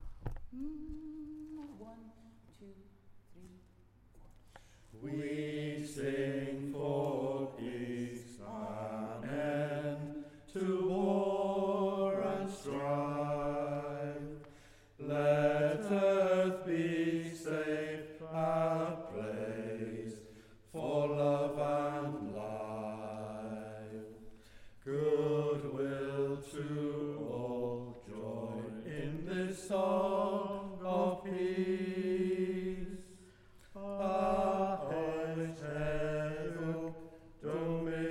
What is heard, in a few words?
peace anthem bass, street choir